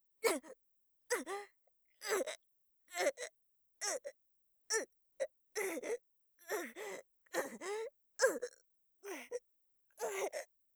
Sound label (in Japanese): ぐっ; 効果音なし